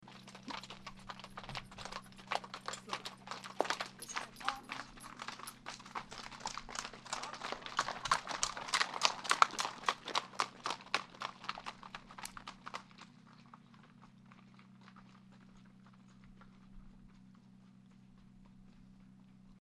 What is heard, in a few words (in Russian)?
prohod po gornoj doroge